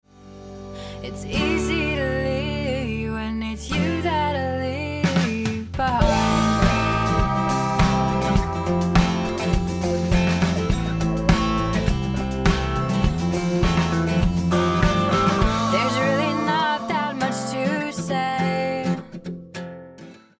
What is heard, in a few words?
Degrad Comb Filt